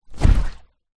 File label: transport boat rubber dinghy small land water hard